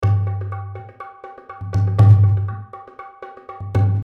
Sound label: Perc Real Percussion